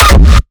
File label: Raw Kick, Hardstyle; Chainbomb; Kick Compact Pack Vol.1